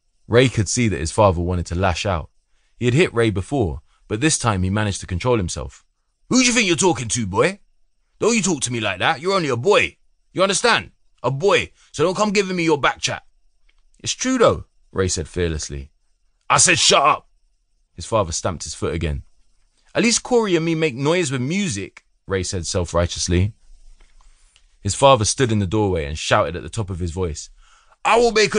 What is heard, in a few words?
Gangsta Rap